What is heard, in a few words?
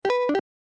alert